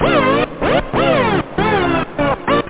TECHNO; da capo vol1; instruments; scratch

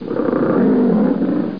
Lion; Workplace Effectiveness: Dealing with Change

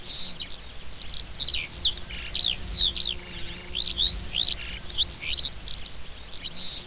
country, ambient; Quake; PainKeep; PC Gamer 46

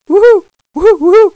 WOOHOO; Reflux

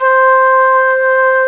FLUTE 72; Dark Forces Demo; Dark Forces Instruments

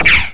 shoot

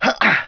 playerjump; PC Gamer IT CD 41; Daikatana

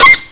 hinge

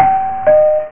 doorbell; funny; Wired for Sound Pro CD; Aristosoft, Inc.

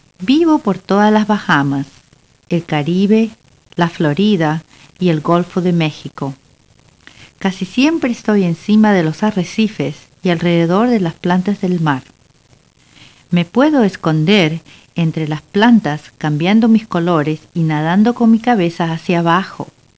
Sound trump

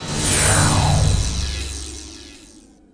Paralyzator; ImpGal2; spacewar weapons

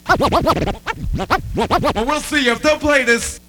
scrtch; scratchs

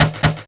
knock; gfactory; impacts

